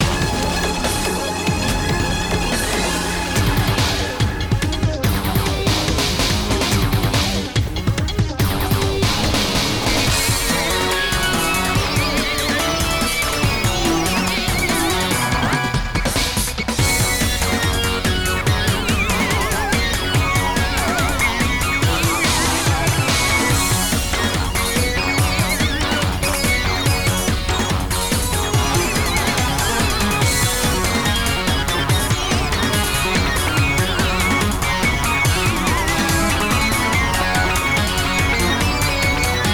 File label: battle legendary; pokerogue; bgm